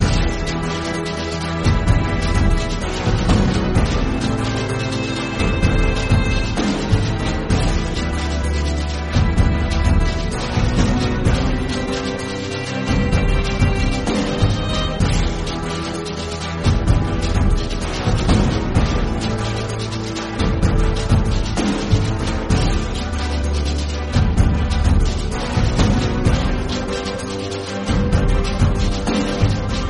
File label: Classical Orchestral